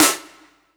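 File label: snare drum, 160 BPM, Drum & Bass; Ueberschall; Drum & Bass Resonance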